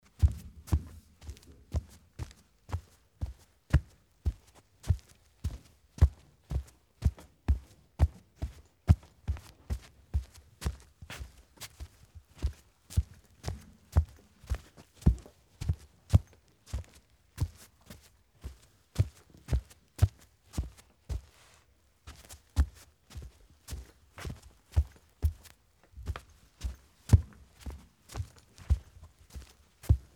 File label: footstep tatami